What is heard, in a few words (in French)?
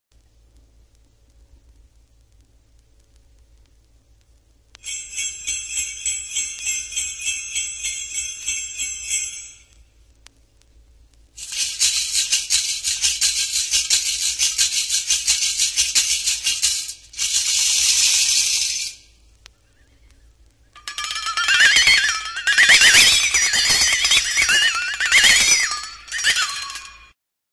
Grelots maracas metalliques flexatone Percussions